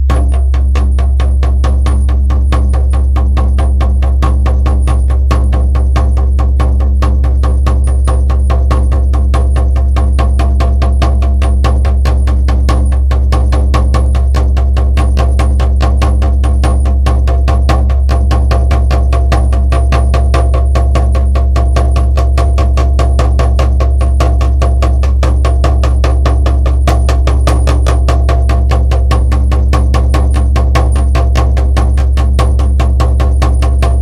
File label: Fast Drumming